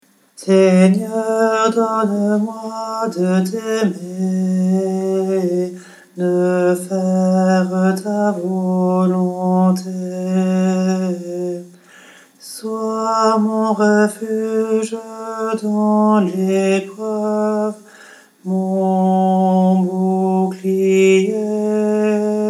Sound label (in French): soprane baryton, choeur d'hommes; seigneur mon bouclier